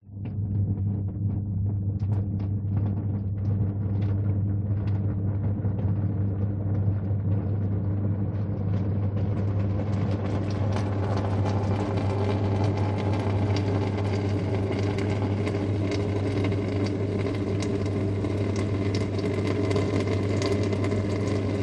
household dishwasher operating water drips motor hum stop